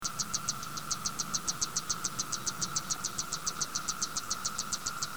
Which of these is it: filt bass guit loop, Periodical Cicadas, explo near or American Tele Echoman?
Periodical Cicadas